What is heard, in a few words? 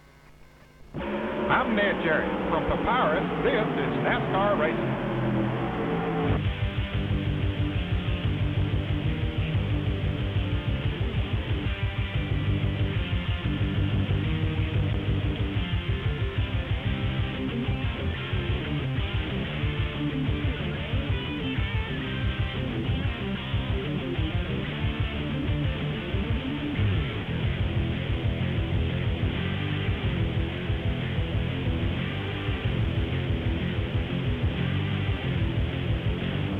Intro ES1868; Compaq; nascar